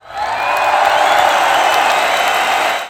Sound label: crowd